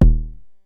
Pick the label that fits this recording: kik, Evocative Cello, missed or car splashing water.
kik